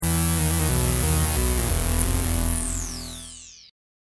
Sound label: Hip Hop Synth Licks 90 Bpm E Swing; Coffin; LYN118 pack of Hip-Hop 90